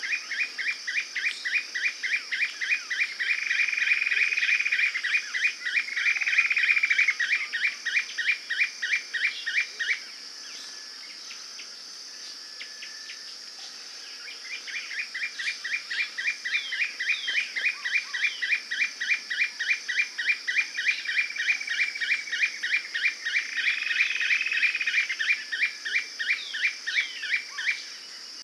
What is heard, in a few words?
Spotted puffbird